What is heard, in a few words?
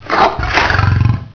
tiger sounds; big cat